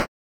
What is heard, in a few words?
key, chiptune; Techmino